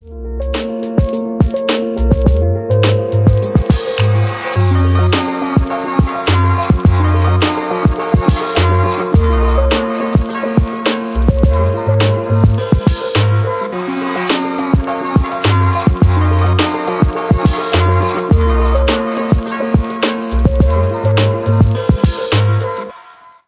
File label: speed up